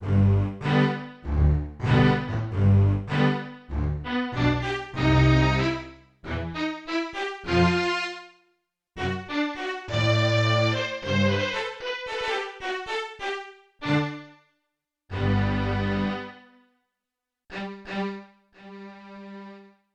Touch Brightness, Yamaha TX16W Sampled Strings

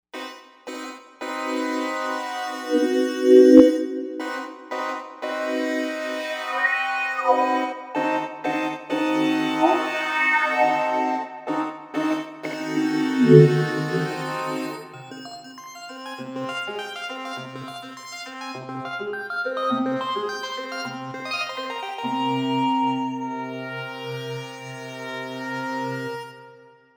Caveing, Novation UltraNova; Factory Patches

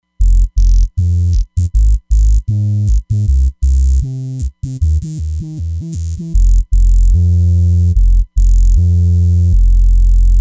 Dawg Bass, Roland Fantom XR

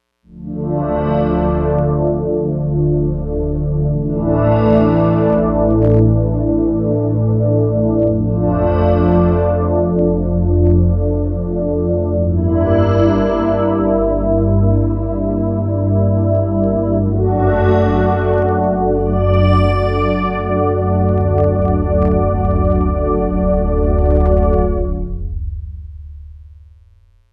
Slow Bell Chor; Digital Synthesizer

